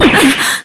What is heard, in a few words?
damage